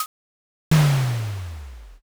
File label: grenade